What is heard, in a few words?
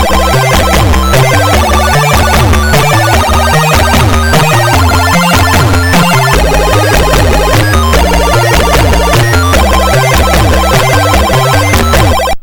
Game Over & Hi Score